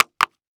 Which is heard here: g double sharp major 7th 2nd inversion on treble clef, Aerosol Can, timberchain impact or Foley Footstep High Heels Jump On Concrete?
Foley Footstep High Heels Jump On Concrete